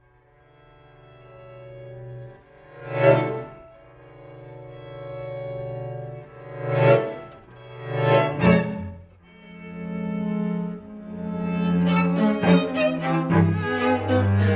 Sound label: String Quartet 3 excerpt 3rd mvt; Liptak